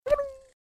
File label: Boomerr